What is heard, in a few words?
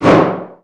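Collision Interior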